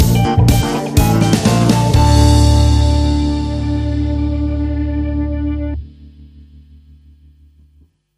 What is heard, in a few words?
Funky Party Music Stinger